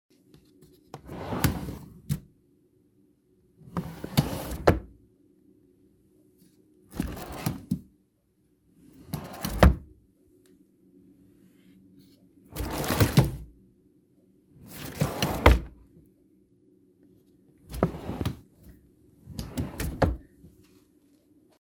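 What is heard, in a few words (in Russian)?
большие выдвижные в гардеробной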